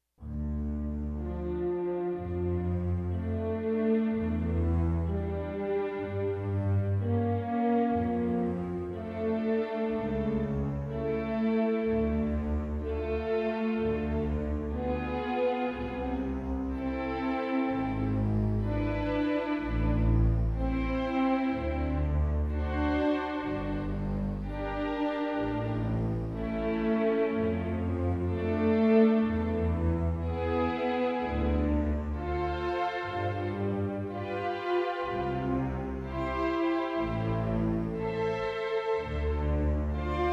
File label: Synful strings; Requiem